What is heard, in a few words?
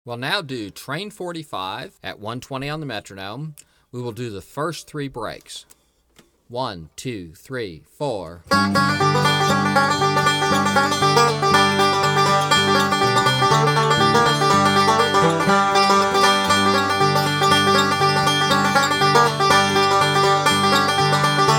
Breaks 120 Bpm